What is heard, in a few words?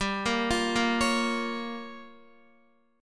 G Minor 7th Flat 5th, Guitar; Gm7b5; arpeggiated